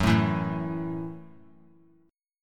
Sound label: Gb Minor 7th; strummed; JGuitar